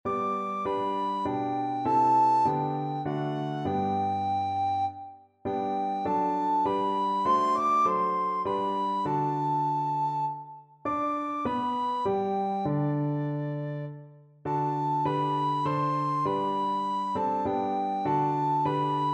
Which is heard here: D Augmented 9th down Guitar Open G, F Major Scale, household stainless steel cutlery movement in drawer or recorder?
recorder